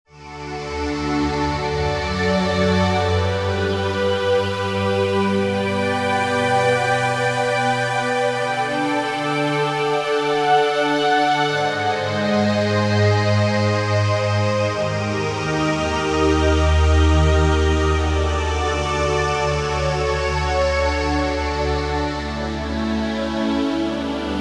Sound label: Combing; Roland SRX-07 Ultimate Keys